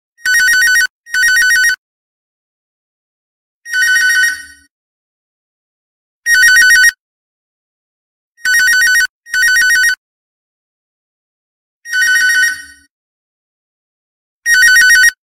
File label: Phones Echo; nhac chuong; nhac tin nhan